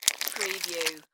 Flesh Rip

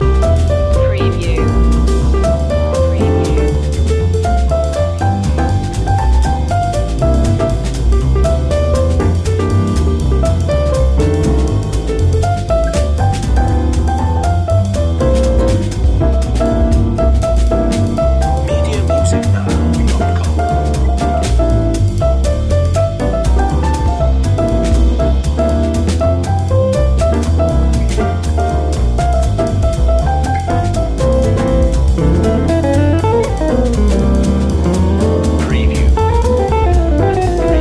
jingle bells jazz long loop

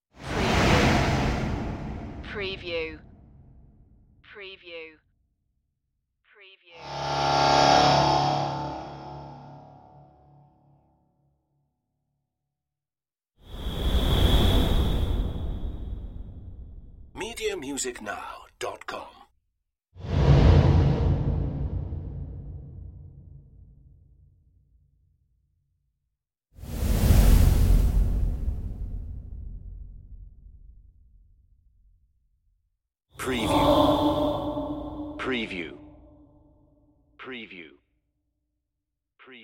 space whooshes

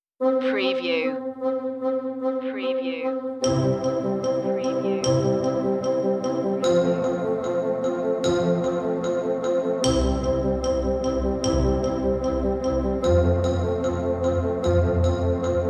underscore

